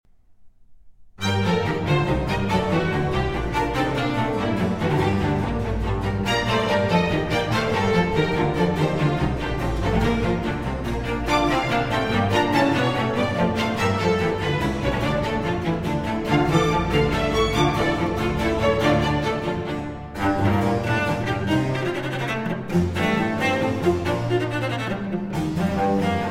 Concerto For 2 Cellos, Strings and Continuo in G Minor, Allegro; III. Allegro